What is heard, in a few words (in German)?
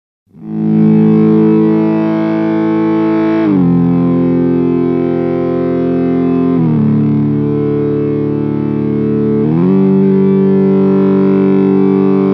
Gitarre mit Gitarrenpreset und e bow; Subdecay Pixelwave